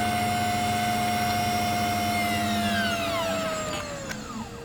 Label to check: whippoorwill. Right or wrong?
Wrong